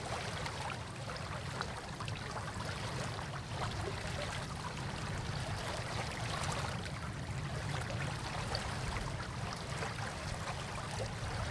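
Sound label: Scottish moor stream afternoon; the 39 steps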